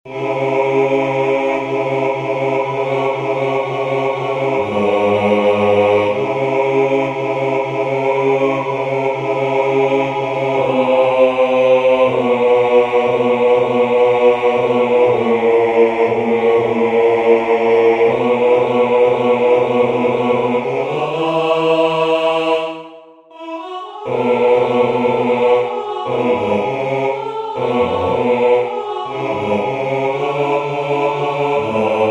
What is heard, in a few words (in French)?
basses